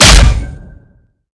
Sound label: take head damage; Valorant